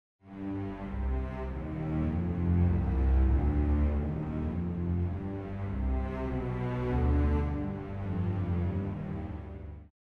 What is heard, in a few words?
Miniature for Orchestra